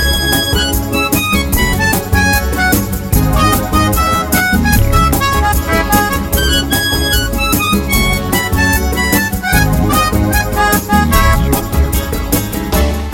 Great Gipsey Song

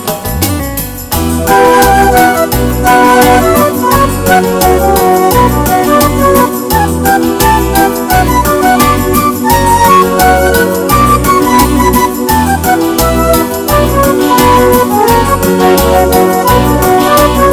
Slow and Beautiful

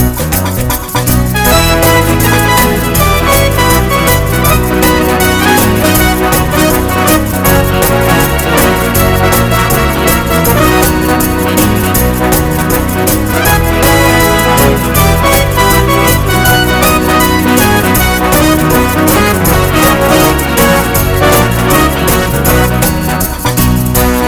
Super Background

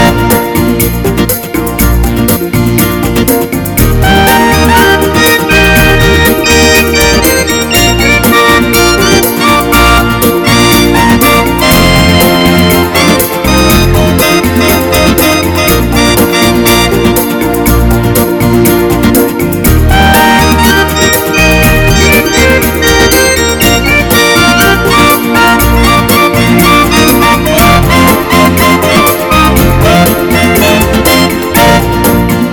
Another Cool Pop

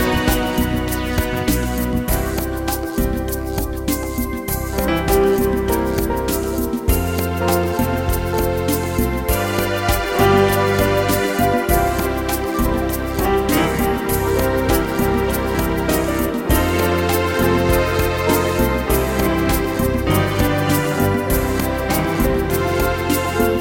Slow and Beautiful

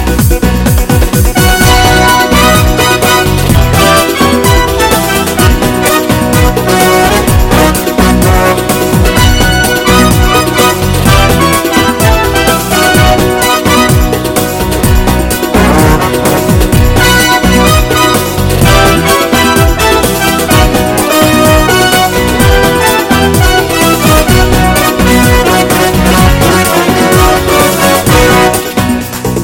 Great Hot Pop

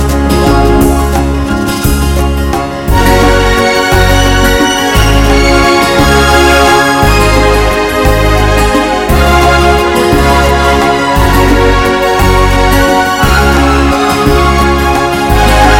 Beautiful Waltz

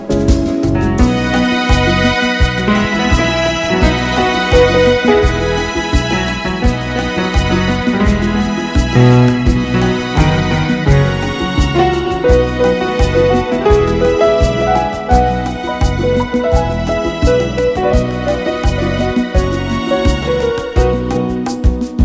Happy Lounge

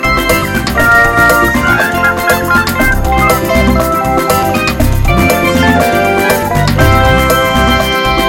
Wonderful Dancing Sounds

